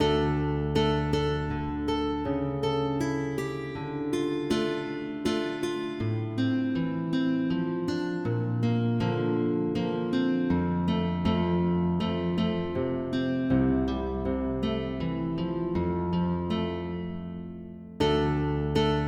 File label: Sarabande, MIDI